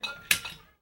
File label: capping